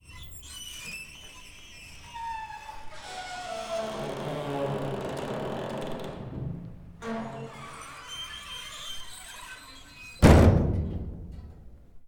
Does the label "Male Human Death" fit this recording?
No